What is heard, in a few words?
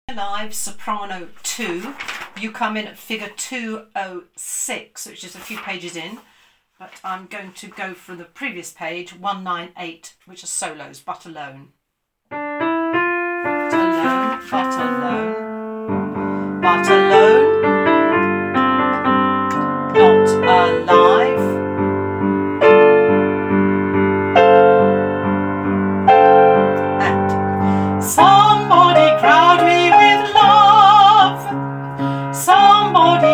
Second Soprano